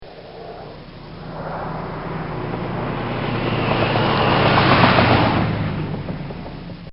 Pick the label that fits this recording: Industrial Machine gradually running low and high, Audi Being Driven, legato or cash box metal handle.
Audi Being Driven